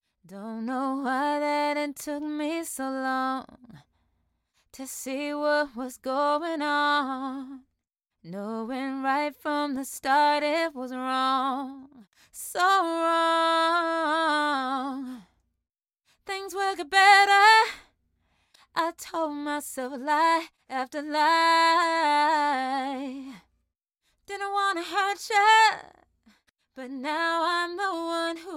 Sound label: pop rnb 120 FM